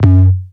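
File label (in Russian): кнопка сухая одиночный резкий монотонный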